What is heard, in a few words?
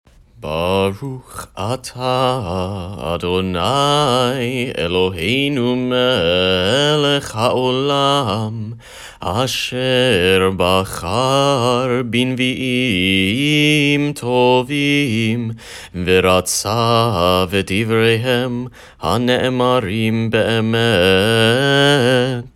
haftarh blessing before chanted